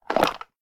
Open A Tupperware; LOOPERS

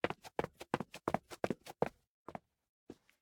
Footsteps; LOOPERS; Tyler

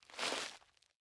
Look Back; LOOPERS

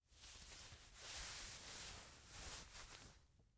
Put Hands In Pockets And Hold The Eraser; LOOPERS

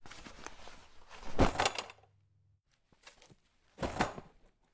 Minivan; LOOPERS

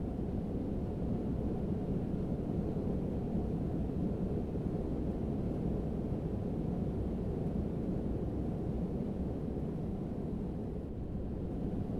In Flight; LOOPERS

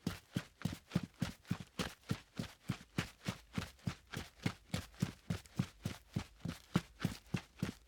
Footsteps Boots; LOOPERS

